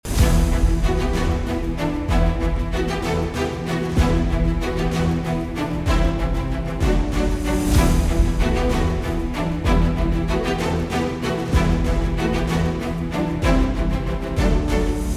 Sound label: lobby